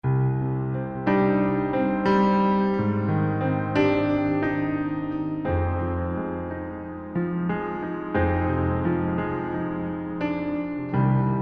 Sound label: Thunderdrums AMBIENCE